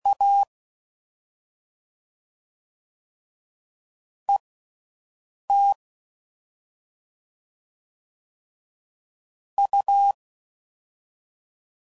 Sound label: Comparisons Broken Characters, CW Code Groups 10WPM; Morse Code